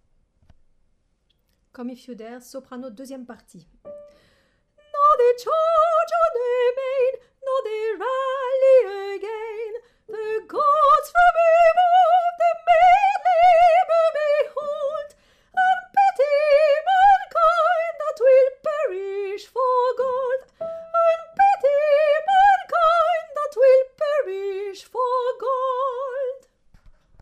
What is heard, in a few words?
Soprano